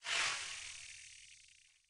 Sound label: Docile Locust Bees Evade